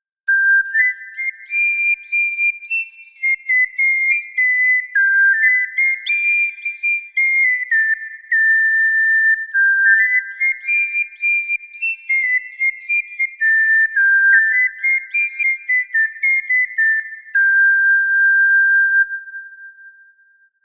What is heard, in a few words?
middle ages pipe